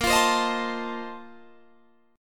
A sharp Major 7th Suspended 2nd Suspended 4th A sharp Guitar Drop D